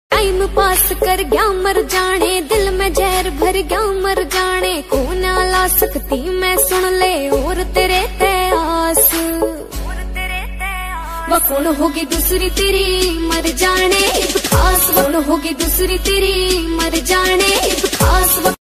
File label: haryanvi ringtone